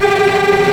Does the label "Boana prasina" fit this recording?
No